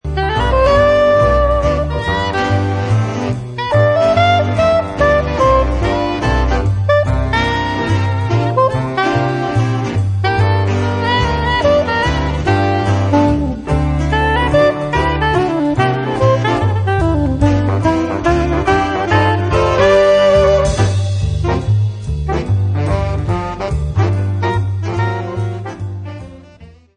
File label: Blues Dance, sax